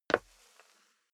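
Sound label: step dress boots wood parquet slide long, footsteps; schritte; menschen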